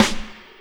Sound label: SD, Dance Drums